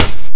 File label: HiHat closed, F#3; Guitar Rock Tour